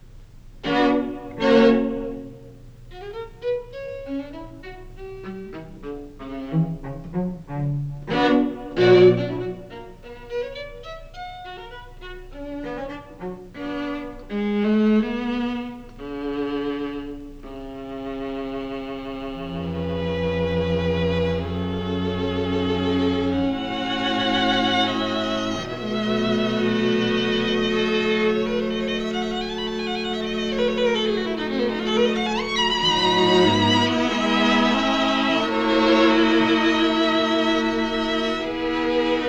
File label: String Quartet; Beethoven; String Quartet No14 Op131; Pascal String Quartet